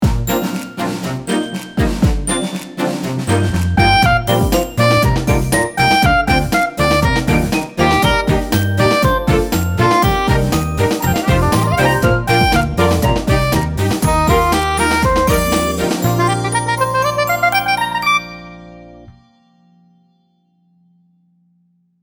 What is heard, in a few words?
TITLES; ZEEZEE ZEBRA; kids tv